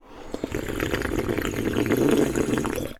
Obnoxious Sip Can; TS Sound; Obnoxious Sips

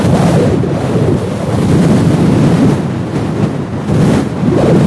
sand wind; hl2; combine gunship

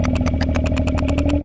shut down; hl2

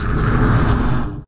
pod hit; hl2

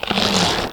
barnacle tongue pull; hl2

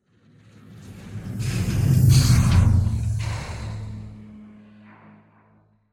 Whoosh Sweeteners Rattle-Mechanics; SoundBits; Just Whoosh 4

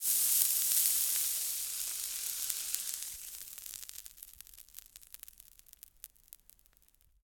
burn ice; 3maze; ICE BLOCK

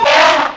throw, zombie poison; hl2

